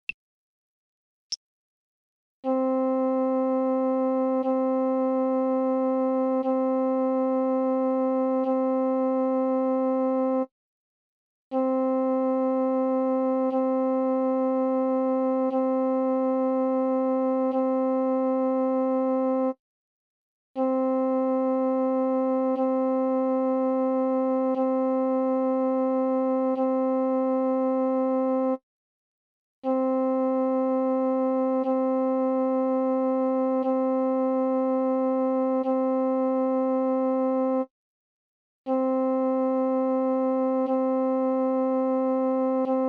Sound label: c flute